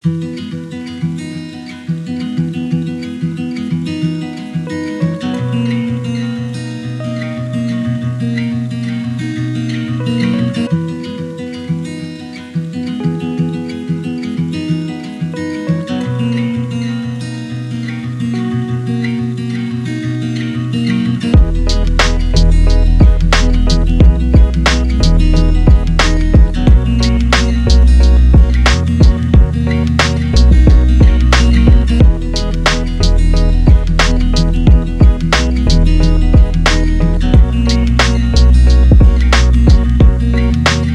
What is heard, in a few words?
instrumental